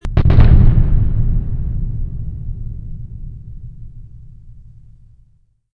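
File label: explosion distant; amb elements; modern warfare 2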